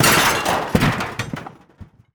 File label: metal break